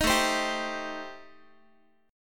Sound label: A Minor 7th Flat 5th A, Guitar; Am7b5; strummed; 18,17,15,17,x,x